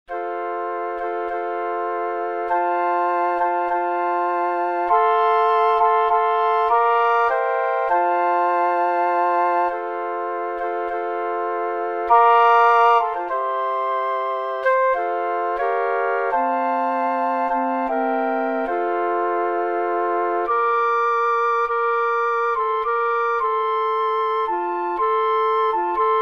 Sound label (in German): Querfloete Quartett